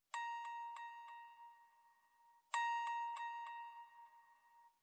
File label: chime, alarm snooze; Mudita Pure